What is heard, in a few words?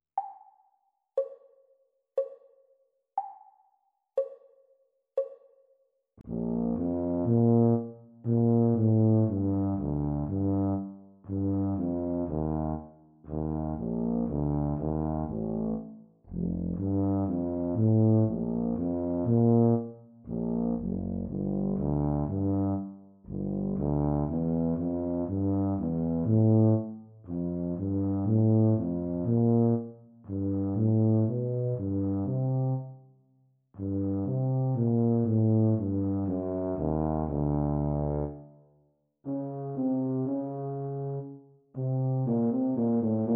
Bb tuba duet practice speed; Grade 8